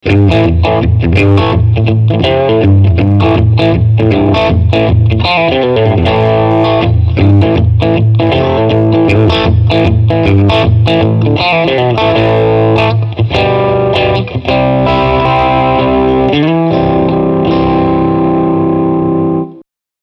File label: Mid Gain